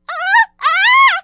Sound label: Enemy Generic Human Death Eaten